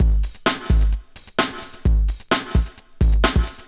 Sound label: drum 130 electro, drumloops; Techno Trance Essentials